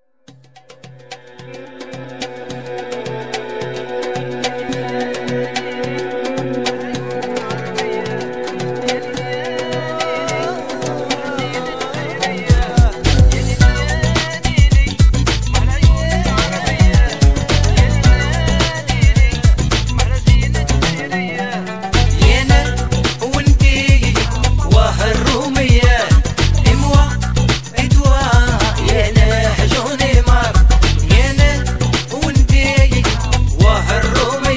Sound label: Arabic Groove; putumayo